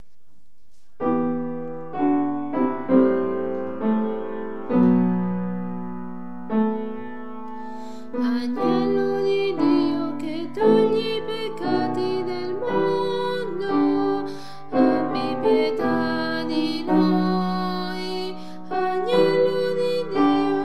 tenori

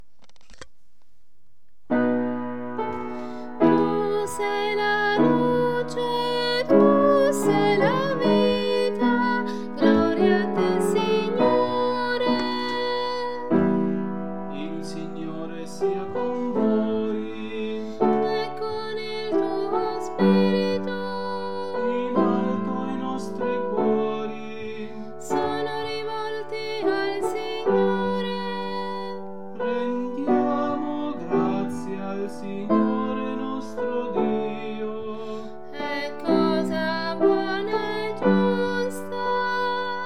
soprani; preconio

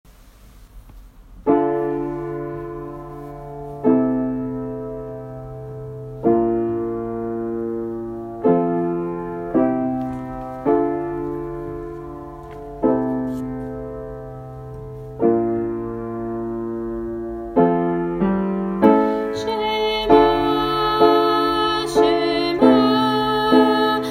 shema tenori

